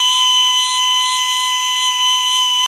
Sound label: ney do